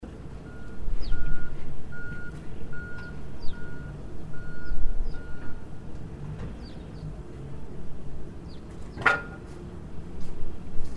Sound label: tascam sample single